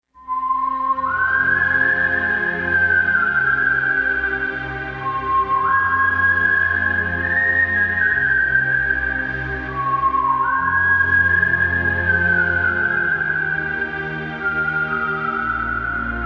western music

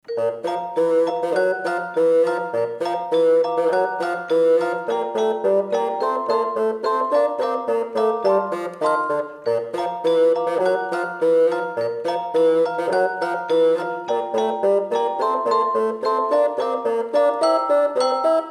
toot toot ding

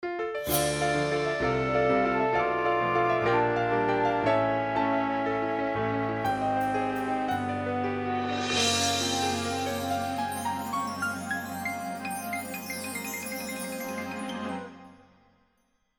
scene transition

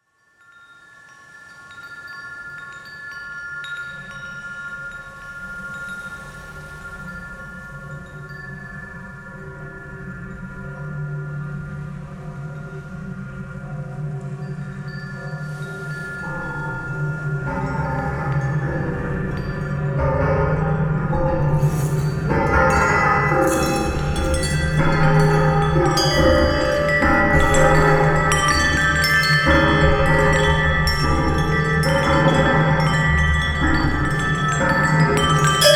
storm

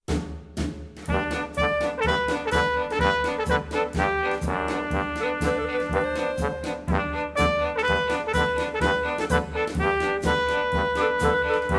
quirky march

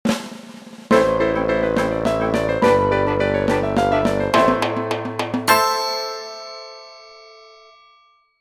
scene end music

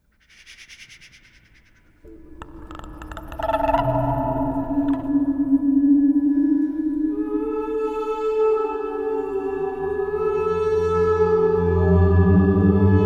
moon atmosphere

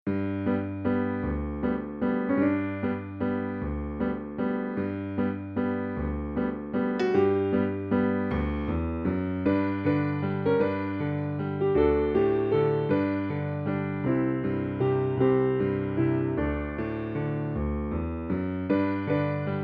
simple waltz

